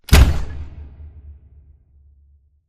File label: Solar Panel Rotate Stop; Boomtown; Titanfall 2